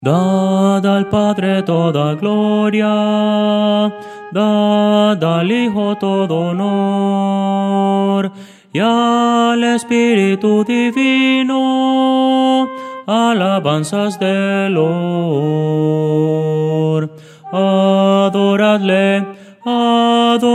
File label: soprano